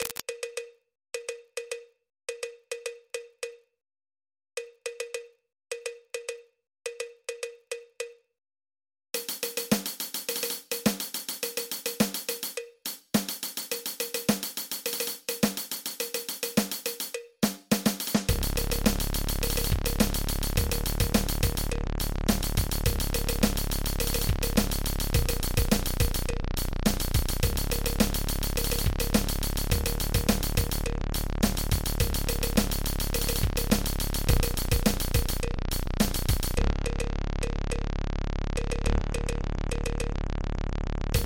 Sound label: Phonk